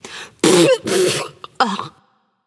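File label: clear throat, female; smite; voicepack; hera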